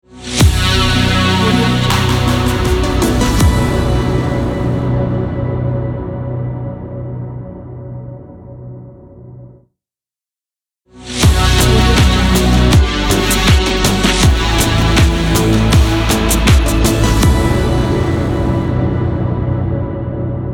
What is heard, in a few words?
synth logo